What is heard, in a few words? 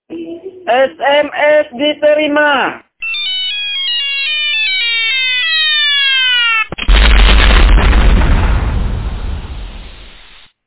sms new ringtone